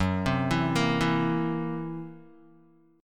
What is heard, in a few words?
Gb 7th Flat 5th, Gb7b5 chord, Guitar, 4E,4A,5D,5G,5C,6F; arpeggiated; JGuitar